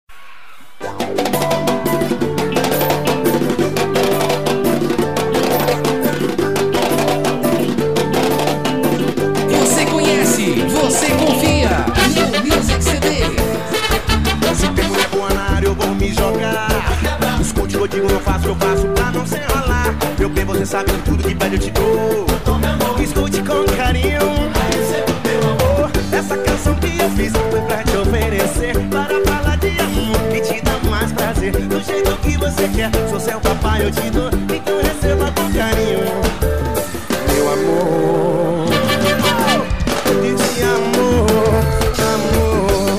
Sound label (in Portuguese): swinga samba